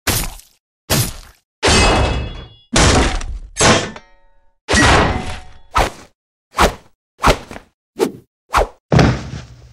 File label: knife hit